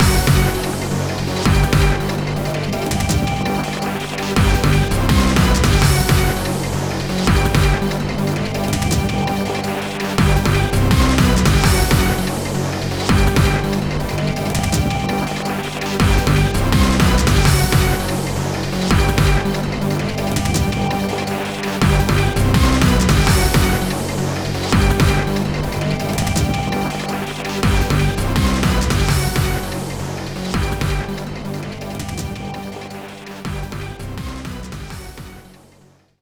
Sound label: Volcanic Rim Stage; Street Fighter IV; X360